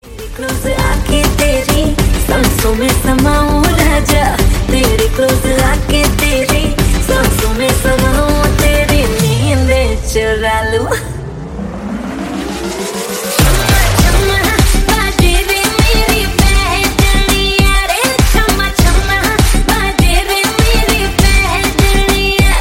remix ringtone

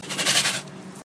bengali tiger ringtone

